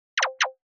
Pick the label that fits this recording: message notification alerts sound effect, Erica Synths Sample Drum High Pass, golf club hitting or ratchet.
message notification alerts sound effect